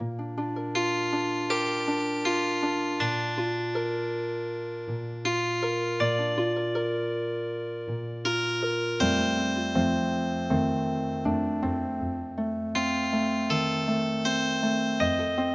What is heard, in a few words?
Healing Harp, traditional; EVENING CHIMES